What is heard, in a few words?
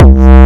bad kick 129 C